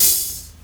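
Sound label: Closed Hi-Hat, Dance Drums; Best Service ProSamples vol.15; AKAI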